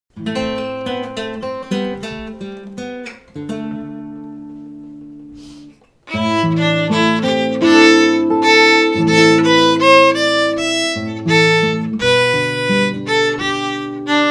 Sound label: Cold Frosty Morning slow